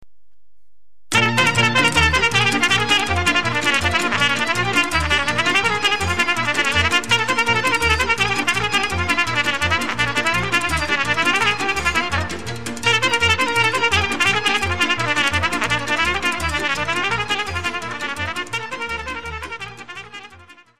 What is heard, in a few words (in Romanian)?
Taraneasca - Roumanie